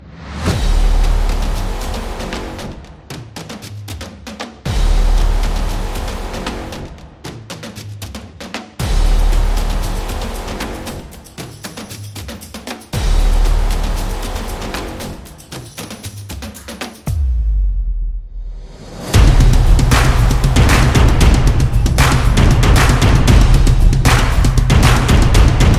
A Sport Action, Street percussion background music built for sport, motion, and dance